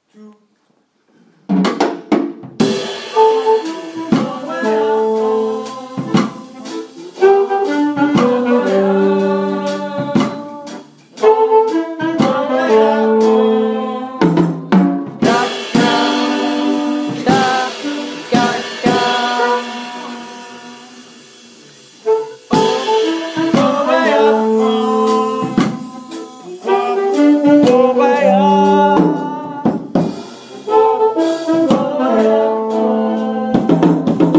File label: Cool reggae Break, brass band